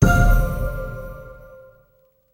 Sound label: item find; escape from frankensteins tower